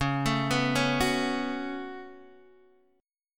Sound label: C sharp 7th Suspended 4th Sharp 5th, Guitar, DADGAD; down; chord shape